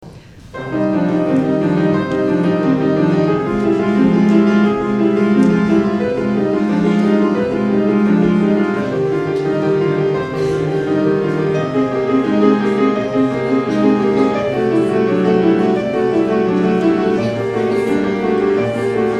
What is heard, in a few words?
Prelude; Bach